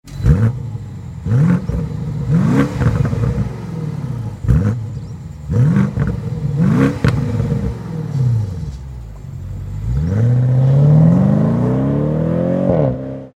BMW M550i G30 4,4l 340kW Axle-Back system Sport Mode; REMUS